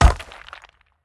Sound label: shield ground; Smite; Cabrakan